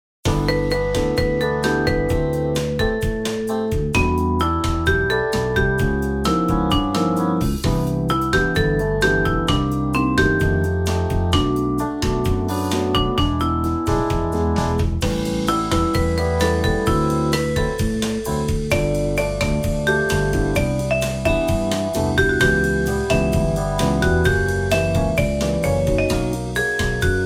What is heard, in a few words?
Bossa